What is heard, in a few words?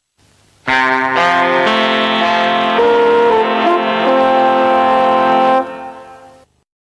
television ident; thames